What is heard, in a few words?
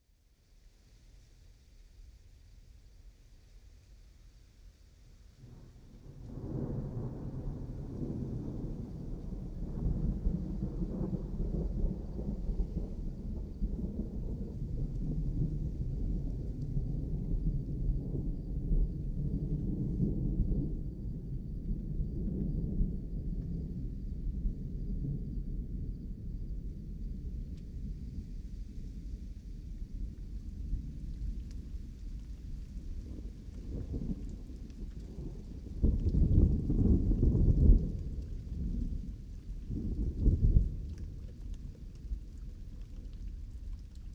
thunder